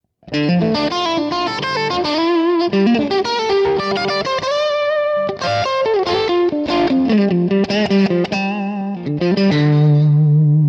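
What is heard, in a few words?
Bridge Middle SC Crunch, guitar; Schecter Nick Johnston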